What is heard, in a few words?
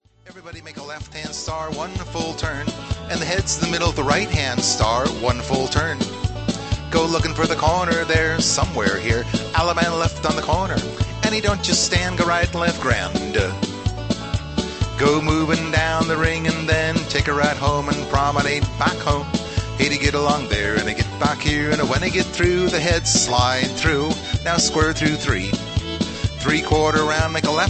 Patter